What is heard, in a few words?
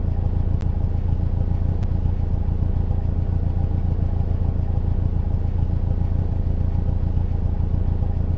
idle, vehicles cars; lwcars